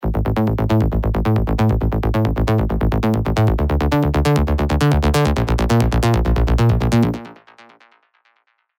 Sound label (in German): Bassbeben, techno bass; Spire; revealed spire techno bass vol 1